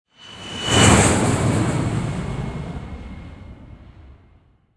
plane passby jet layer; War Thunder

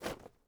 pickup tarp; Rust

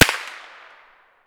tt fire outdoor far; Escape from Tarkov

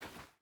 footstep hide sand walk; Rust

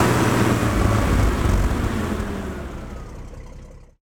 engines; World of Tanks

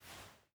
ironsights up; Rust

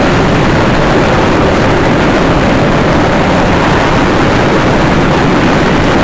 Missile Engine; Weapons; DCS

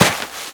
foot dirt sand, footsteps; Call of Duty Modern Warfare

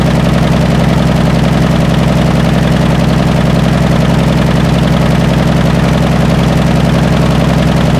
pt76 engine rpm load; War Thunder

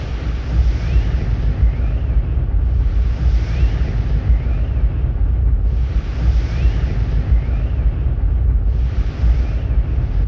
ship engines; World Of Warships

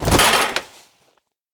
bodydrop proflist; Escape from Tarkov

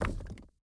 ui drop stone; Rust

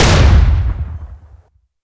Hit Med, Damage; DCS

